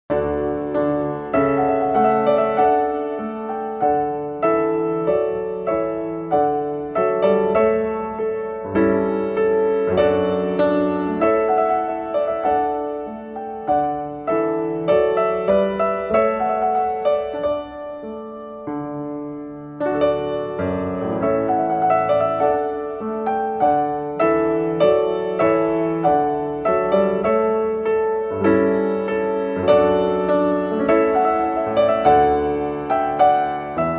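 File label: Piano version; Trumpet Voluntary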